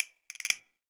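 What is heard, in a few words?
3D Perc Lock, phone lock sound; Pantech; Discover P9090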